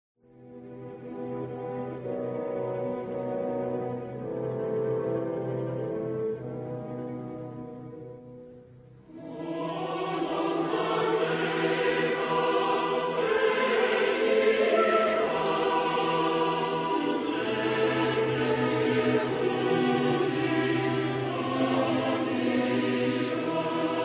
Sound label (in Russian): gimn izrailya atikva